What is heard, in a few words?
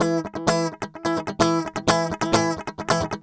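guitar, 130 BPM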